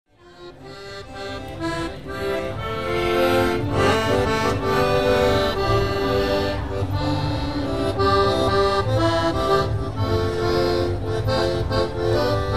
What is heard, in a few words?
ferragosto accordian